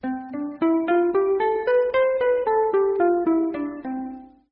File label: Guitar Scale